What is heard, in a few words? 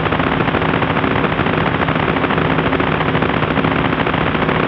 loran-c, LORAN-C :Long Range Radio Navigator Sys